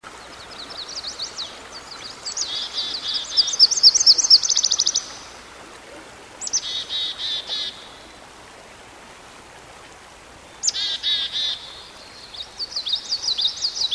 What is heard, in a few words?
song bird, Birdsong